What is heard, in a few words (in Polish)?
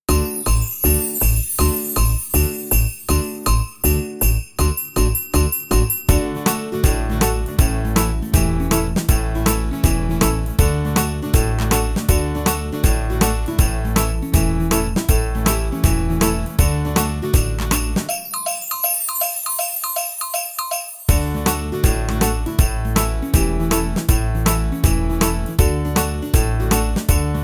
sanie z mikolajem instr